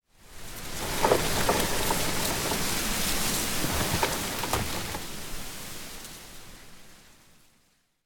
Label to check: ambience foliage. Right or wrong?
Right